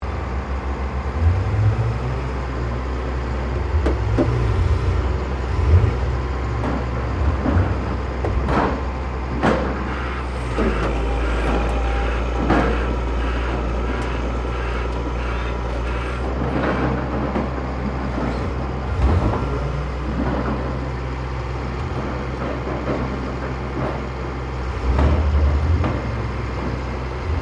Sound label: Construction Drill Maneouvering